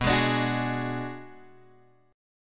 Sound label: B Minor 6th B, Guitar Open D